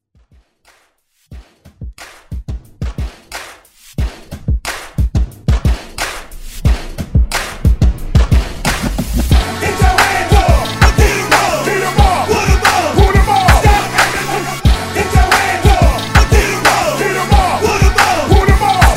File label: Hype